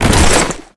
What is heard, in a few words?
rocket pack land; tf